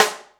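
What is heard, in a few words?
snare, EMU Orbit-3 drum machine; 300 Drum Machines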